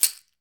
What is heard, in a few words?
CAXIXI HI, Latin Shakers